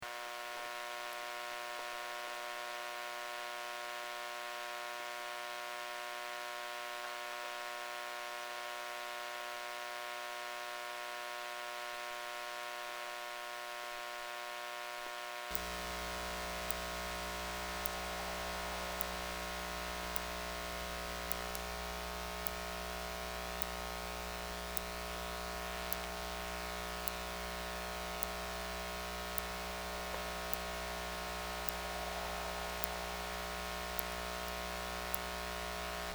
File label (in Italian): elettromagnetismo cancello elettrico